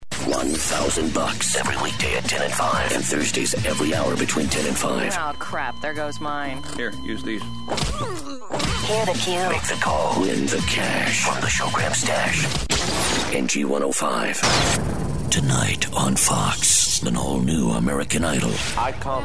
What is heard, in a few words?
growl